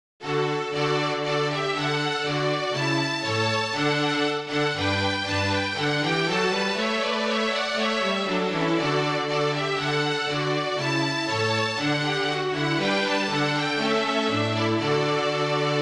Charpentier Trio, Prelude to Te Deum, Flute Trio Version